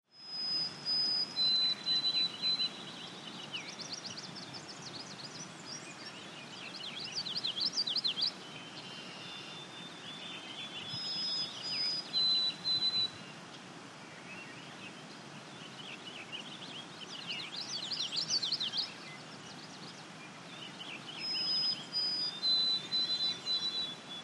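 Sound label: Forest Wind Background Light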